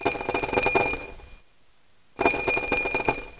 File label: glass